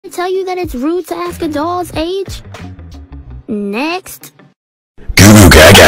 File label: goo goo gaga doll